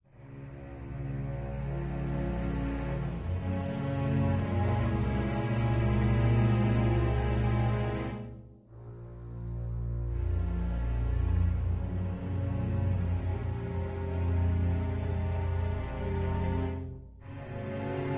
Dialog (Loop)

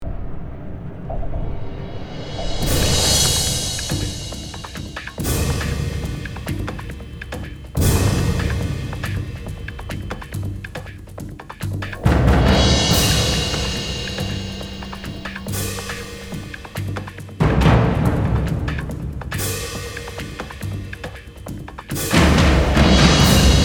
Battle E 140 bpm; Disney Infinity 2.0 Marvel Super Heroes

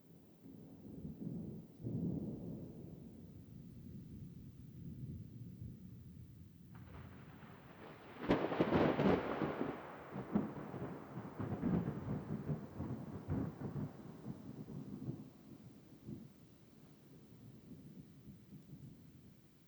SFX thunder loop; This Is the President; 2021 gamerip

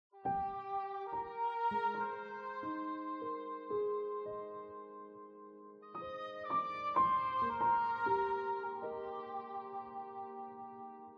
Music; Outro Father Room Start

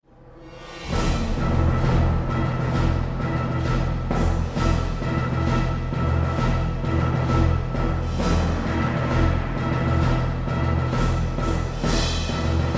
battle; Disney Infinity 2.0 Marvel Super Heroes; zurg

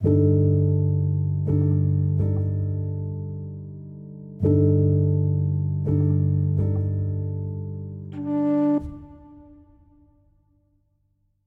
82bpm 3 4 time; chicory a colorful tale; macos ps4 ps5 switch windows gamerip 2021

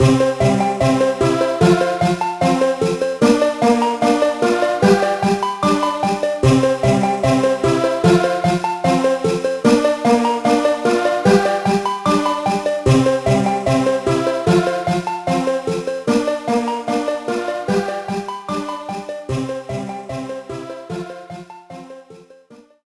Good Rating; santa claus junior gba gamerip 2002

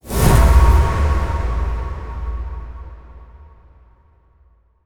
light orb close

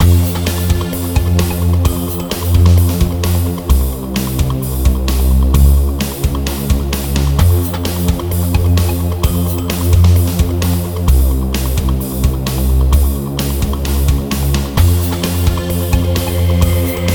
Bridge Battle Loop; lost sector

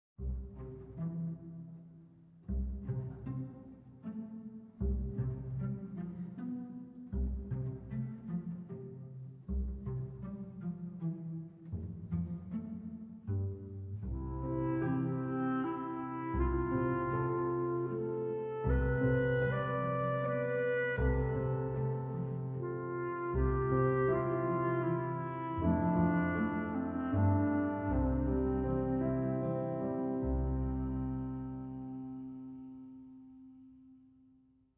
Woods Bus Stop; Everybody's Gone to the Rapture

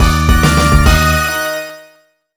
Jingle Ok; zombiez seeker; nds

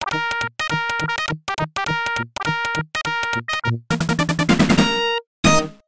Game Clear; Dudley-Do-Right; The Adventures of Rocky and Bullwinkle and Friends; Genesis

